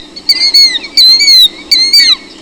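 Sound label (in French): pluvier kildir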